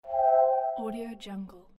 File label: healing spell